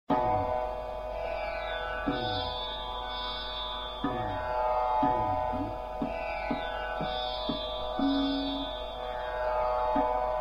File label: Bada Khyal, Ektaal Vilambit; Rageshree; Rain Ke Jaage Ho Piya